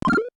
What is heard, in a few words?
eat pill